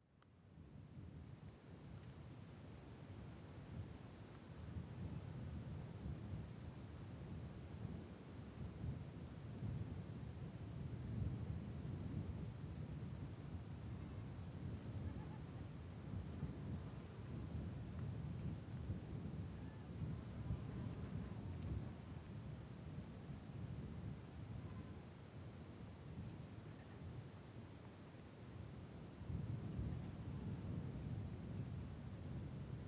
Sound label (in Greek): Ξερολιθιά Χειμώνας; Ξερολιθιά Χειμώνας (ΗΧ); Prin tin Kserolitia